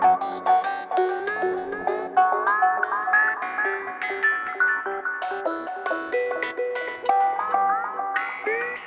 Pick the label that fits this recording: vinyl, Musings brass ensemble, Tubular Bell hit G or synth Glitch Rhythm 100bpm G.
synth Glitch Rhythm 100bpm G